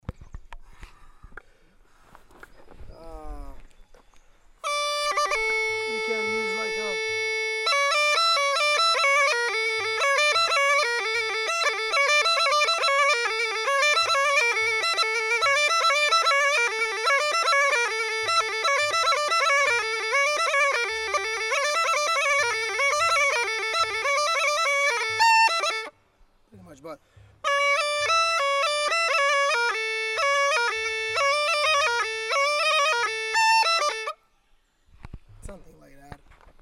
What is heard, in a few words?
kurma exercise, Gajda; Lesson Recordings; Petar Usmivkata Aleksiev